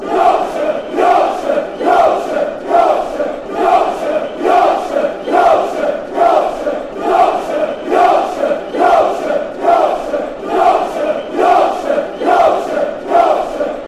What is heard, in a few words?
yorkshire fanchants; sheffu